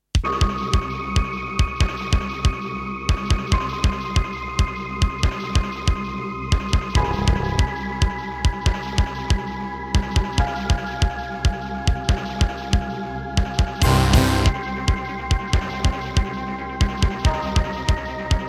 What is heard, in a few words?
Gallant GMW-1000; sigil doom episode 5